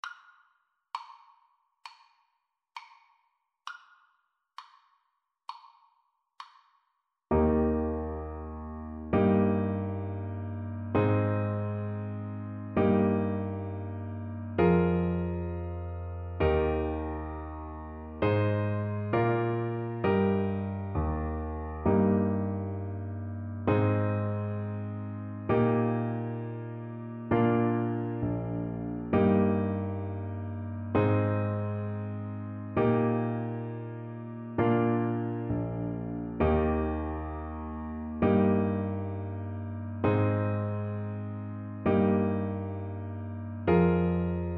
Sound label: twinkle twinkle, Tuba